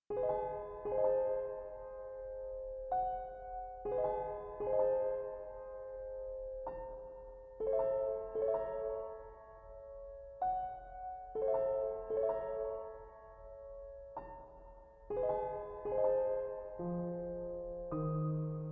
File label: Quarter-tone Piano; Quarter-tone Piano Prelude No. 12